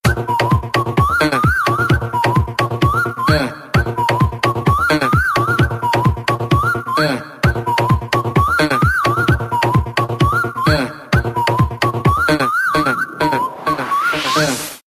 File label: whistle remix